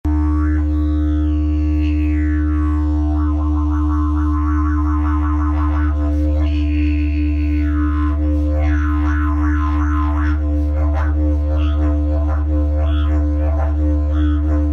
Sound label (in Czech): jilm, didgeridoo; jilm jakosen